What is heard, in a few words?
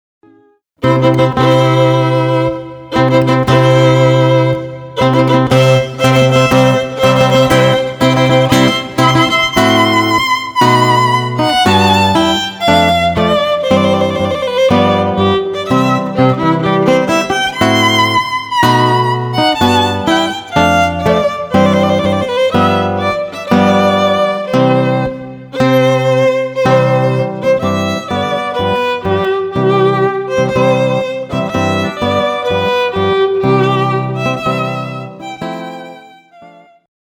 Wedding March, Duo, guitar violin; Mendelssohn